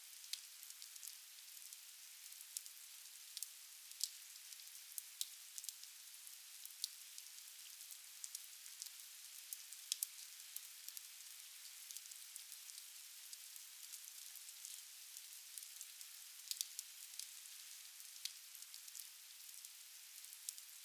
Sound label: Rain Drops Loop; weather fx; Silent Hill Downpour